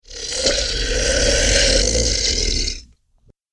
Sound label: Bad Breath Creature, monster; Monster Sound Effects; Andrew Fleming